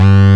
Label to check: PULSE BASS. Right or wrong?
Right